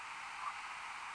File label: Unknown squawk